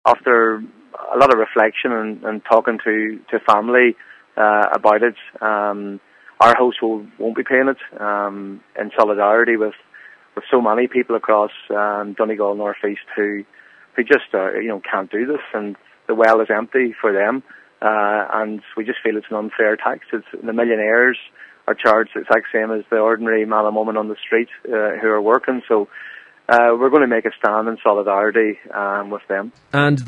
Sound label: pad